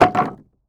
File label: wood plank